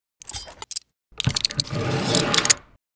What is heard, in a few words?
Painting Door Compass